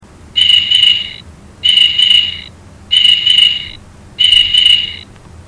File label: cricket